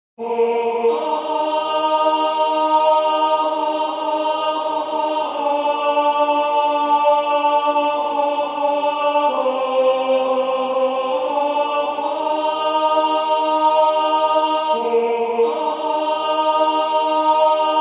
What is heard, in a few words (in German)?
SATB Alt; einzelstimmen